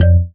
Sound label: marimba raincoat; ゆめ2っき